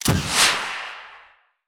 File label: tools launcher